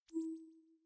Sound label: notify; Scrat